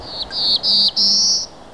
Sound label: black th blue, birds